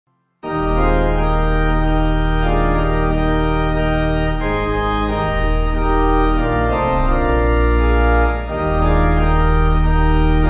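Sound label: Pipe organ, church music backing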